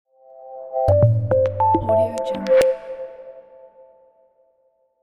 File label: elegant soft ident; secondsquaremusic